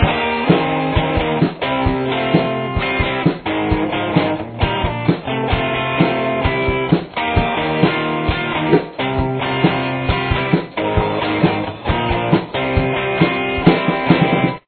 intro guitar

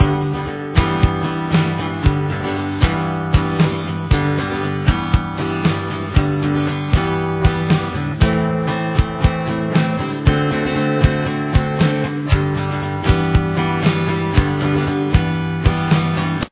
guitar

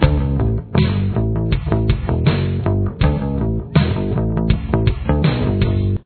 verse bass

